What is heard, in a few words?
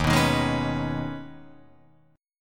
Eb 7th Suspended 4th Sharp 5th Guitar Open F, Eb7sus4#5 chord; strummed; 3,6,3,3,4,6; JGuitar